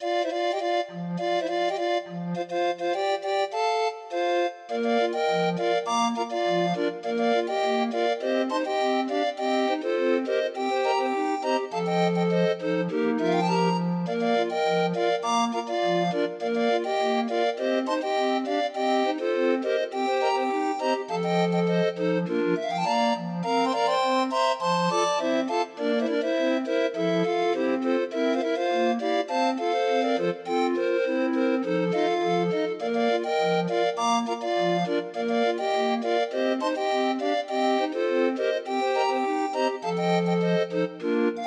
Grind Organ; Demo of 20 note MIDI file

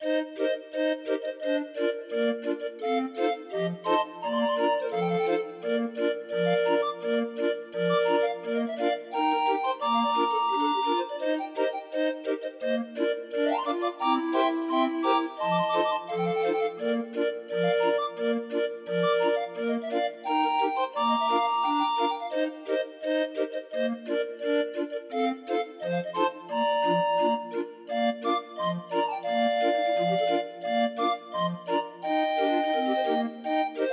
Jingle Bells, Grind Organ

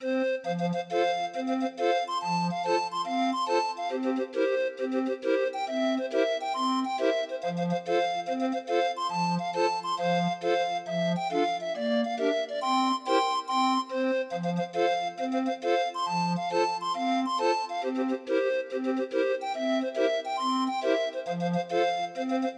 Trumpet Hornpipe, Grind Organ; Arrangement by Stephen Simpson; Demo of 20 note MIDI file

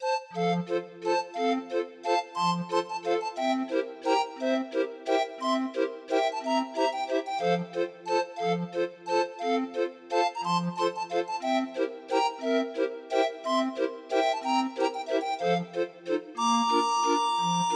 Cuckoo Waltz, Grind Organ

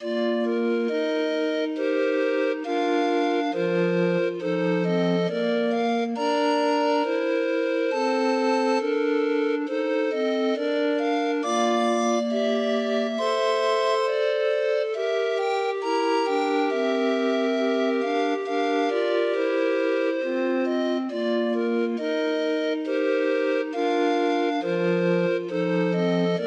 Grind Organ; Nimrod

